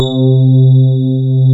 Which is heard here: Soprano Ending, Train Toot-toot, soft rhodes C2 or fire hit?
soft rhodes C2